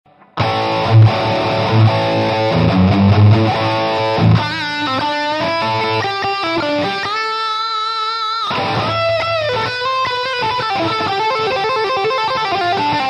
plexi HU dist, PODxt Live

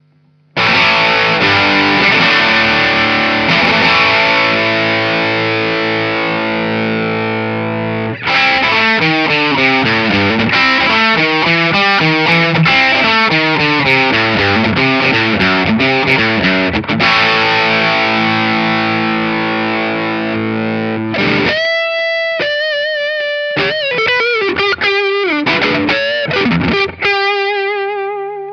pedal p90, tele

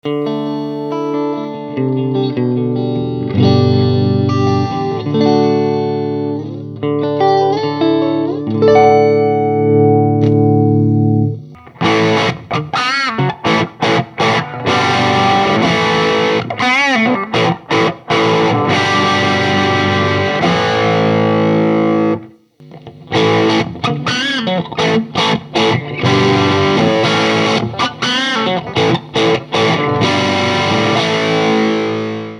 strat

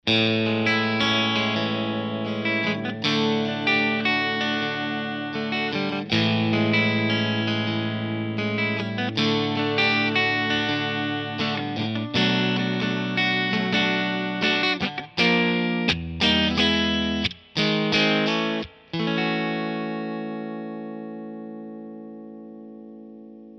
clean, mesa

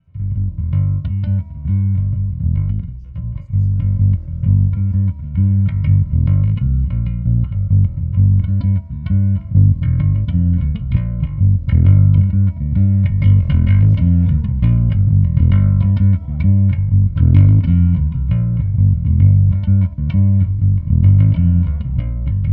humbug, bass

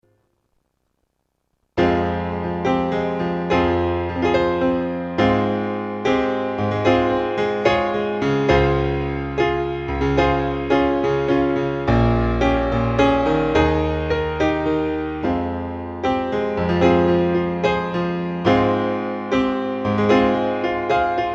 My piano

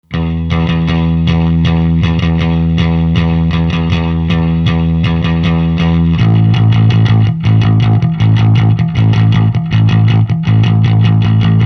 bassman

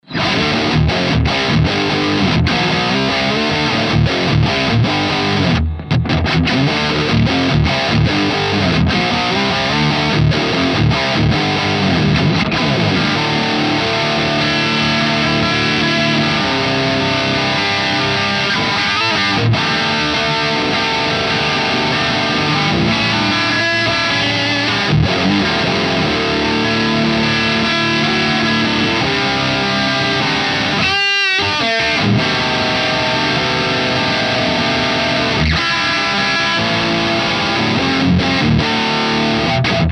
Dual Recto Modern 2 kanal

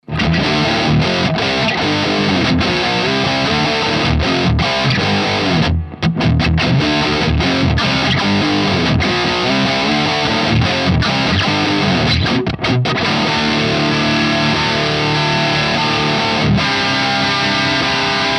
Dual Recto Vintage 1 kanal

